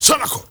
MALE RAV, MALE NOISE, Heart of Africa; Spectrasonics; Heart of Africa 1

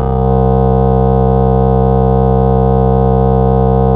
Elec Org C2, Fairlight CMI IIe; Keyboards

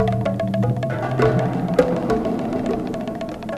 C BENDING; Northstar; The Wizard VOL-1; AFROSIS

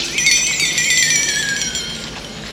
Bendi Metal; Jungle; FX; Zero-G Jungle Warfare 1, 2 & 3; 90 s Sample CDs

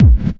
hardcore 1, bassdrum

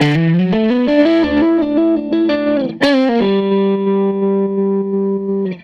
Strat Guitar Loop

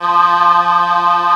C 605 CHOR, Yamaha C605; TECHNO-BWARE

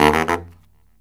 Killer Brass Riffs

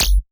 Percs, Elektron MachineDrum SPS1 MKII

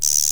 ELEC. GUIRO; Greytsounds VOL-1; CLASSMACHINE

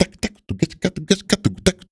Percussion Loops, Brazilian; Houseworx

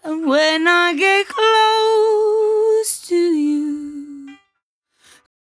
57bpm Am, Velvet Vocal; Zero-G

